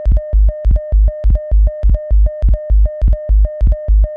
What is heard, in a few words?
techno